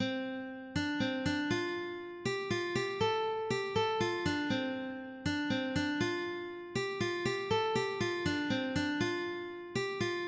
arirang, ukulele

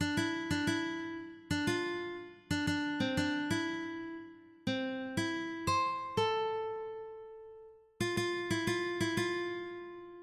šira, ukulele

